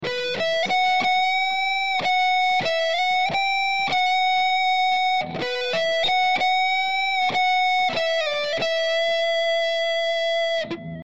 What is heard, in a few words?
Melodic Overdrive